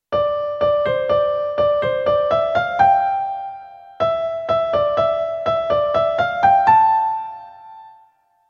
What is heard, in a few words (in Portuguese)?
polifonia